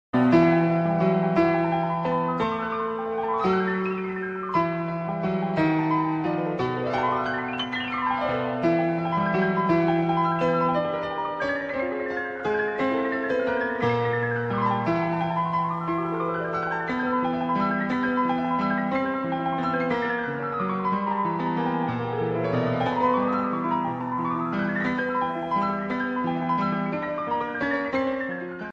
piano ringtone; harry potter

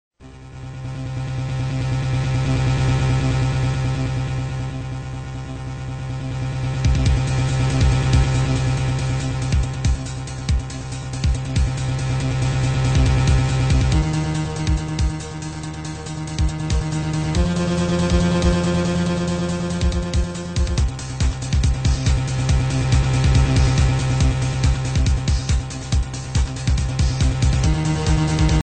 suspense ringtone; samsung

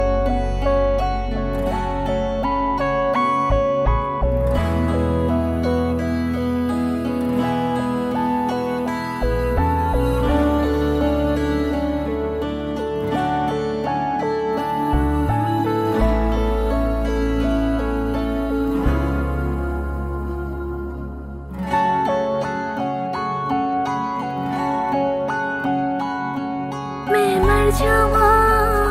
music ringtone

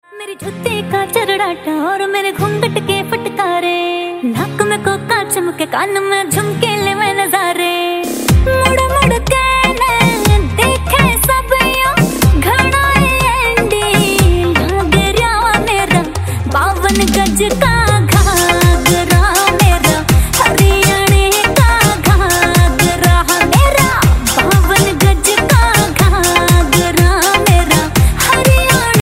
pleasing song tone ringtone, bollywood ringtones

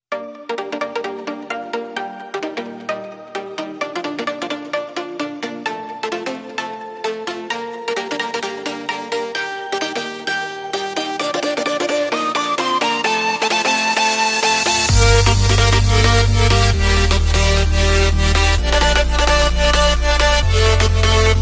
new funk ringtone

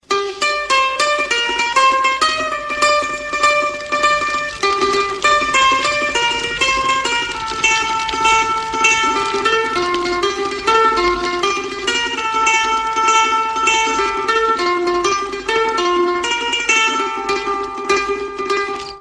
mandolin ringtone

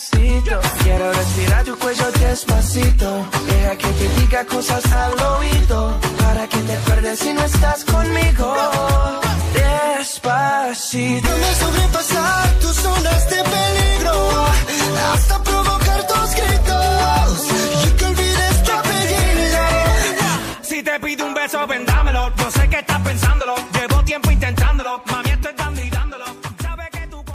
sad music english ringtone

